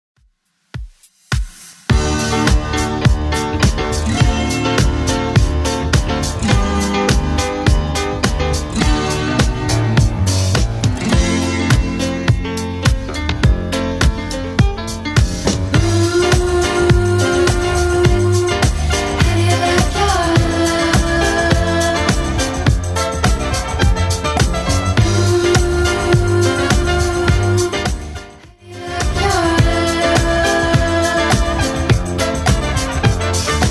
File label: 60s Dance Redrum 104bpm